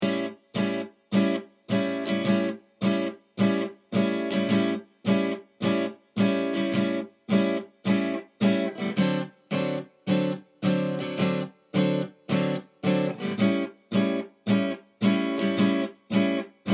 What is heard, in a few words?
12 Bar Blues in A